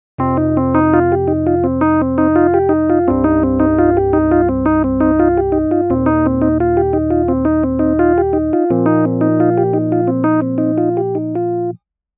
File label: Verse keyboard pattern